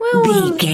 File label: voice fun small character emote sad